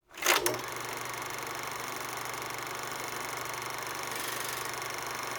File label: Projection Loop; Yu-Gi-Oh! Master Duel